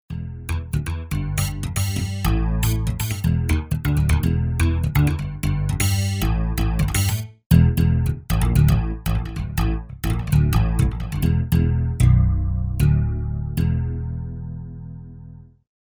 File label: 5-Strng Bass, Roland JV-880; Factory Patches